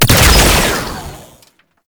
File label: Shield Drone Explosion; Enemies; Abilities; Warframe; Tau; Dax Medic